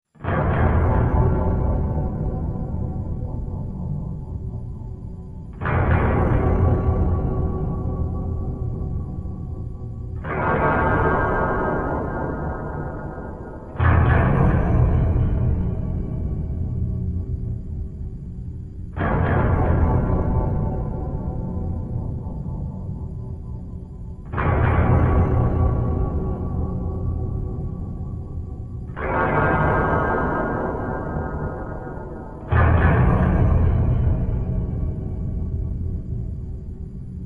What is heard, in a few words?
Metal Drum Concussion Boom Metallic Accents 8 Impacts; Trailer; Underlying Drone; Hits (Sound Effects:Horror)